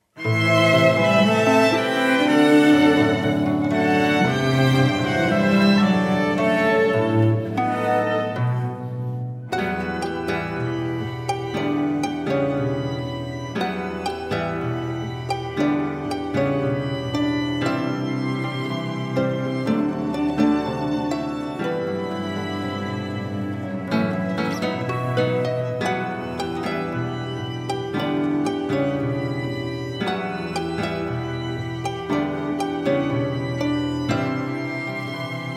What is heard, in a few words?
pian